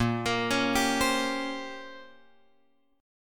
A sharp Minor 6th Add 9th A sharp, Guitar chord; A#m6add9 Chord {6 X 8 6 8 8} arpeggiated

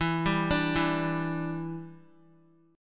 E Minor 7th, down, Guitar; Em7; arpeggiated

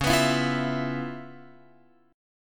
C sharp 7th Sharp 9th C sharp Guitar Open F; strummed; x,8,11,11,8,11

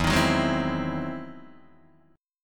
E Augmented Major 9th, Guitar, Open D

